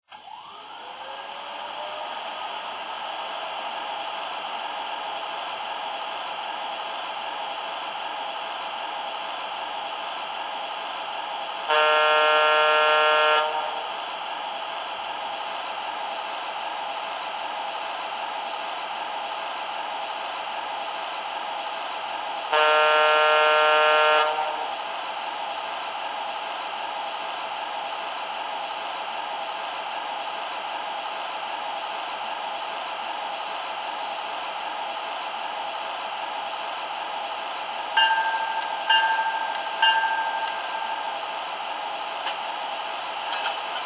ef3b

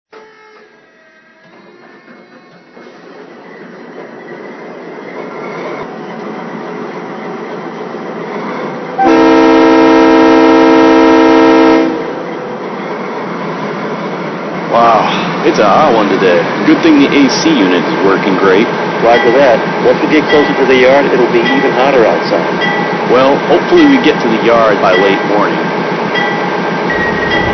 GE Dash-8-40BW, trains

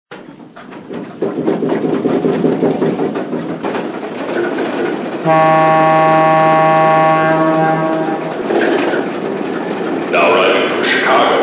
Alco PA, trains

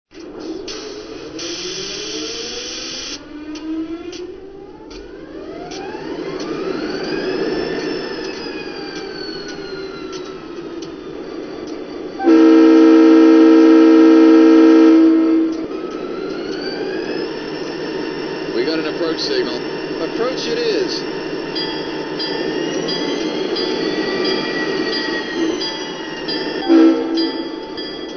SD40-2

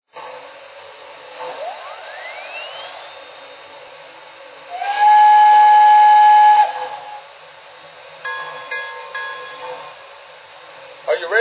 decapod, trains

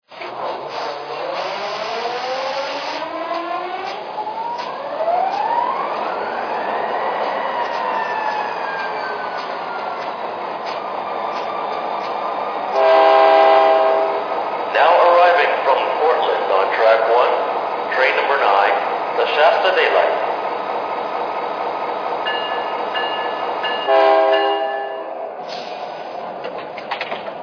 SD45, trains; SP

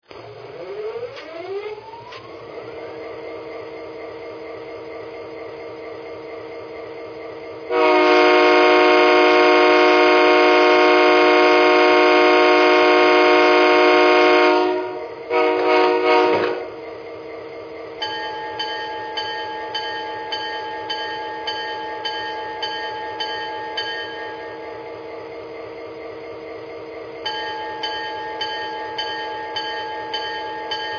F40PH, trains

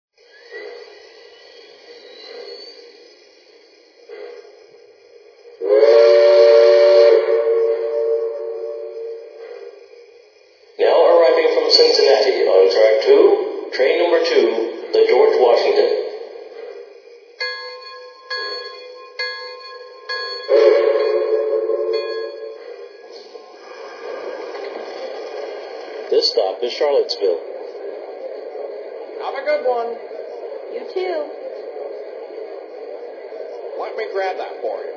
berk, trains; cno

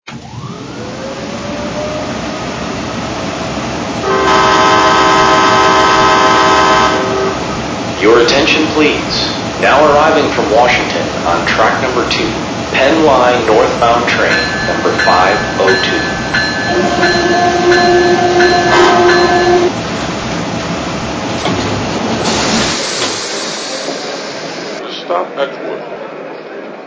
AEM-7, trains

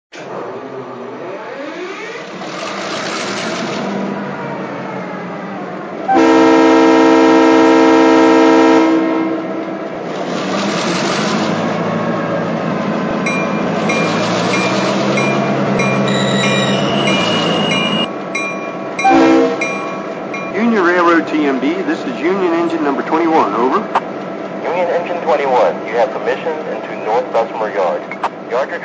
MP15AC, trains

